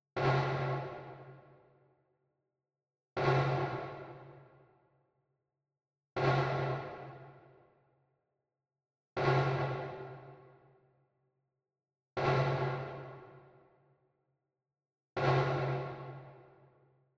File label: Zion Snare slap; Google Earth VR